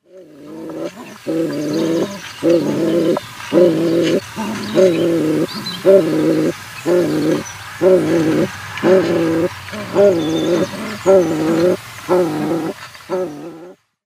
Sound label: Stock Dove pair